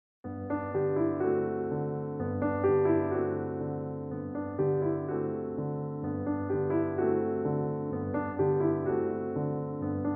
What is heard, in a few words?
piano; fauré; theme from berceuse; from dolly op. 56